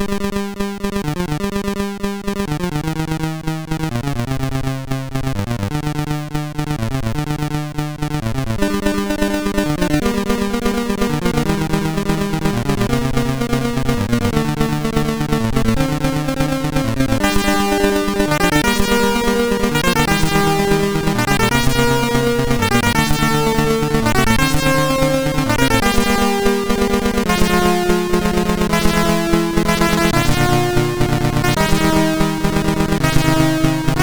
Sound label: Remix, MOS6581R2